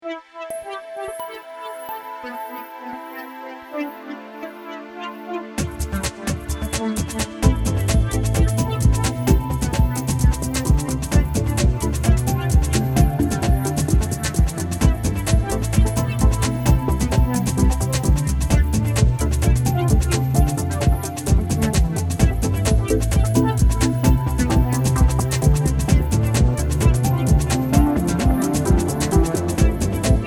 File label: electronica